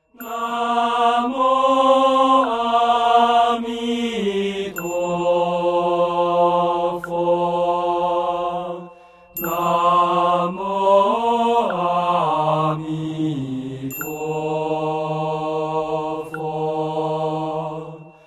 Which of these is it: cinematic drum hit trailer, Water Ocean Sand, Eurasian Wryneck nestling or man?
man